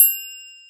reverb drum ride sample G key, ride sound tuned to the G note; cymbal drum